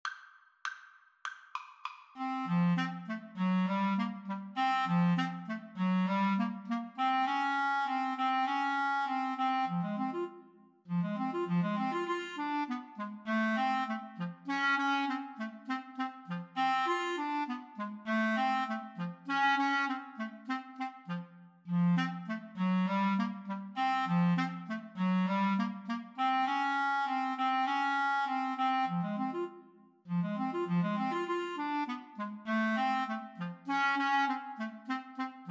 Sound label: Clarinet Duet